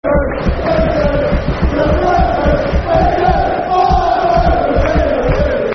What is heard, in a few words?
Football Chant (Soccer Songs); Bristol Rovers; fanchants-com